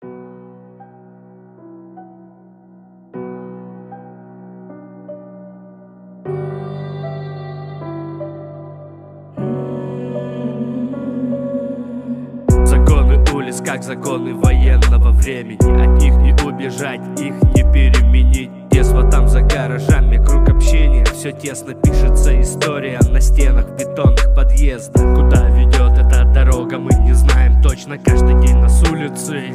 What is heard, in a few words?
VIBE HOUSE